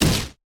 Mine Explode

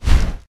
Fireball; Yharon

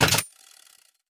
Wulfrum Hook Grapple

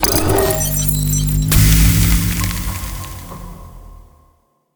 Cooling Cell Install

